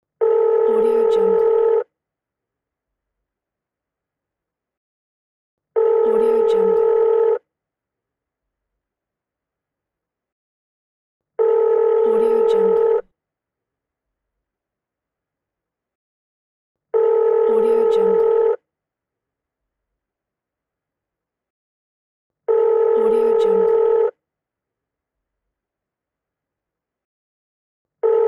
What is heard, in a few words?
phone ringing